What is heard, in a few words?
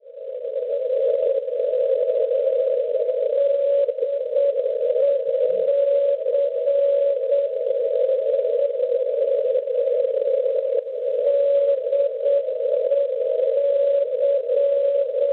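non-directional beacon 331 kHz; DEC; Decimomannu; Cagliari; Sardegna; Schwarzsee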